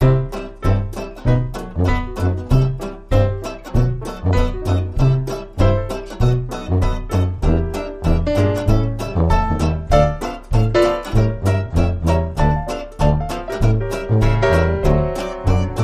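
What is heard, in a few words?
Instrumental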